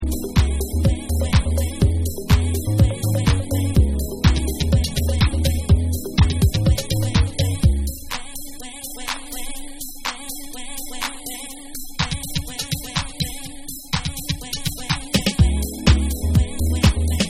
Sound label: Ambient Mix